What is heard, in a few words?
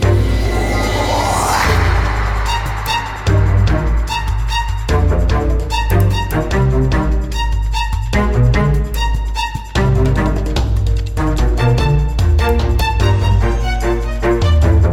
Bossfight; Avencast Rise of the Mage